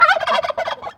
turkey; falling tim

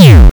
throw; project hawk

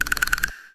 familiar teeth; wesnoth; The Rise Of Wesnoth

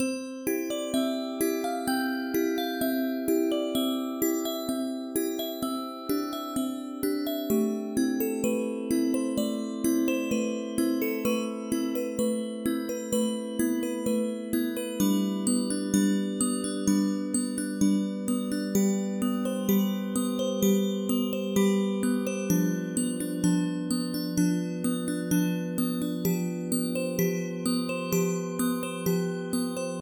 mallet music box motif; source of tales

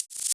spider; edgar; enemy

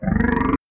throne door; project hawk

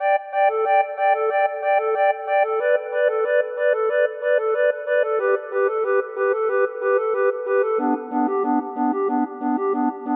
ocarina overture acoustic; source of tales

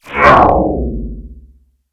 spell; edgar

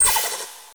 turbo bov; rigs of rods

